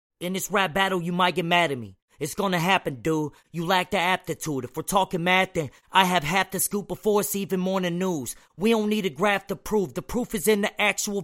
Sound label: Rap Battle HH 53 Fm